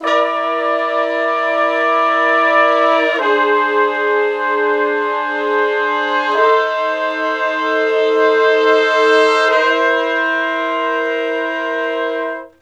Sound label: Slo Blues Chords [V, IV, I, V] (F), Horncraft for R&B, Sections; Sonic Foundry (Sony Creative Software)